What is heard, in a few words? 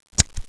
molotov light, grenade